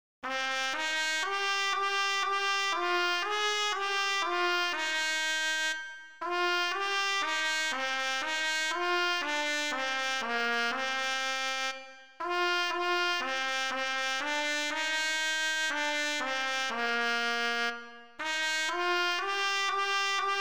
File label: O Come O Come Emmanuel for Trumpet and Trombone